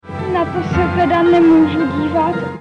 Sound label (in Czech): MONO, Mono vysílání; Televizní technika